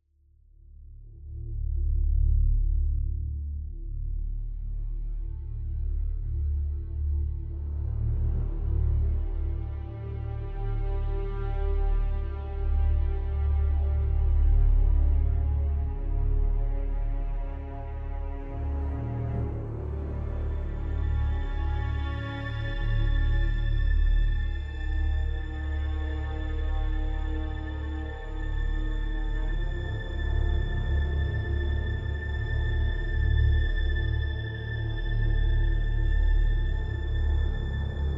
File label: Loop Ominous